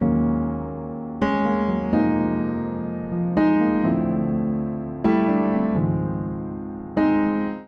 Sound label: Progression Smooth Ebmin9 125 bpm, Upright Piano; MIDI Clips; Skating; Ableton; Factory Packs; Spitfire Audio